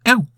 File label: Chorus hurt